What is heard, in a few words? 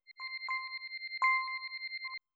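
granular kalimba accent C major; accents; bespoke - Floral v.2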